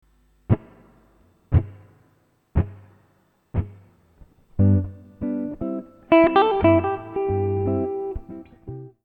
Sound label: Lick